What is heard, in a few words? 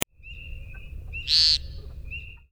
woodcock